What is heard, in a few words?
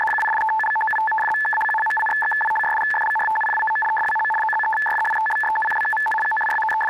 scanner